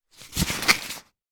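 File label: game money note movement as separated from wad; monopoly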